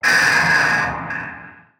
attack; Barotrauma; Legacyfractalguardian2

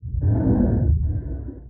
Drown Female

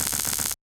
Taser Hit; Weapons

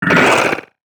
attack; Barotrauma; Husk

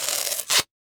Bandage; Medical

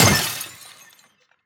Glass Impact; Damage

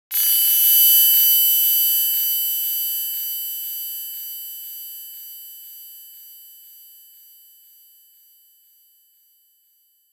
Tinnitus

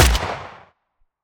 assault Rifle; Security; WEAPONS

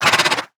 damage; Barotrauma; Hammerhead

